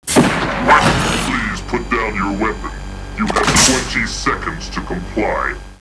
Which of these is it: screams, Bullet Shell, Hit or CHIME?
Hit